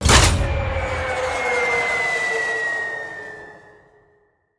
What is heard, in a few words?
Bomb Release And Fall; Scraprun; Deathrun